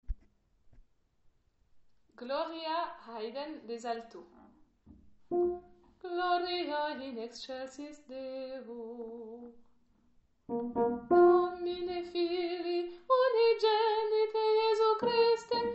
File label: Haydn gloria alto